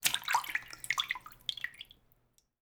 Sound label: Aqua Drip; Dynamic Aquatics